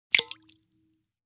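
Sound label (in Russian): korotkij plesk na steklo ili v stakan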